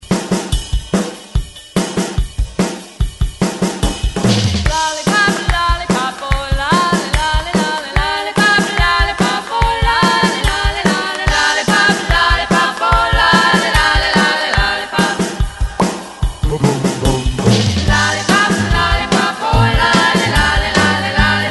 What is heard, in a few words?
144Bpm; DJDOLLS; ReDrum